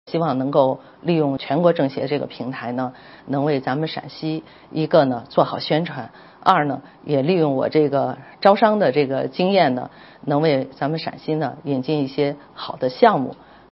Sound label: music